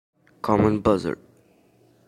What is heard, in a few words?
Common buzzard